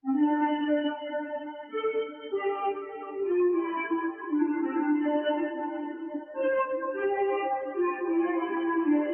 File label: 105 BPM A Min Lead; Loop Stems; Cymatics; Cymatics Gems Vol 6; Confessions